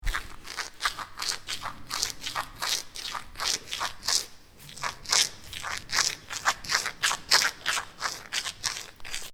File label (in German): Wand ratschen